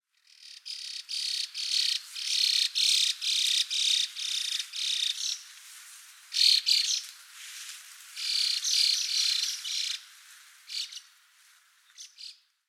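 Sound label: Chinese Hwamei, tama; koe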